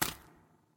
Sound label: footsteps nest; Fate The Cursed King